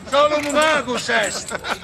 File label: charge, Voices Emotes; civ13; civ14